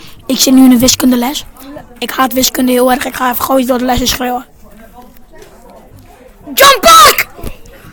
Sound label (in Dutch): wiskunde schreeuw